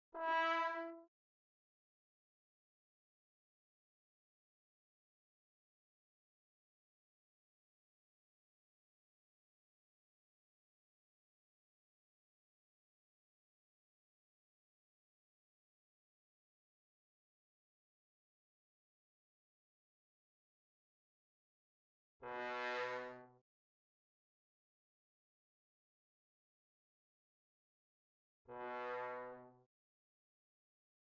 Trombone

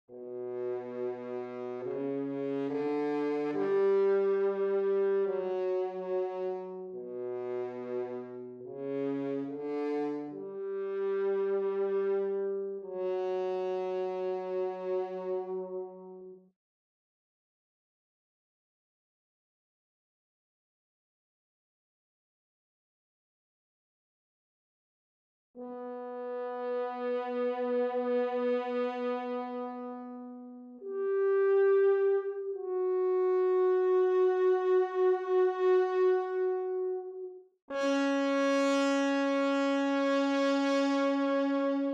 Horn

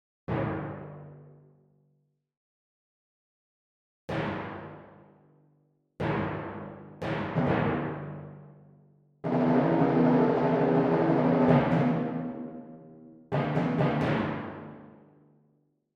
Timpani; Holst Jupiter

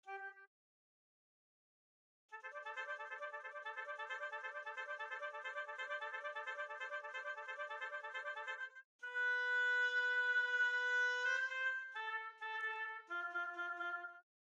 Oboe; Holst Jupiter

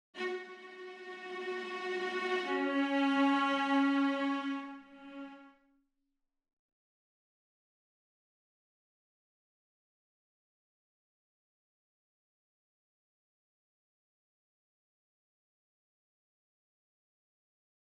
Violas

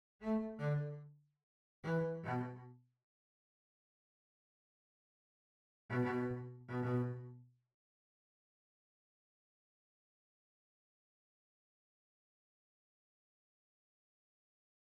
Cello; Planned ending for collab quartet

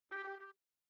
Trumpet; Holst Jupiter

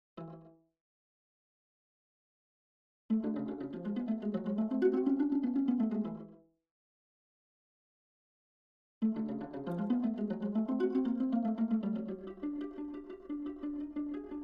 Rondo Viola

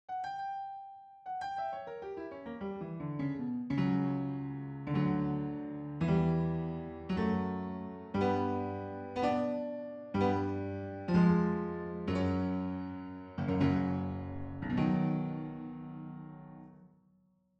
Piano

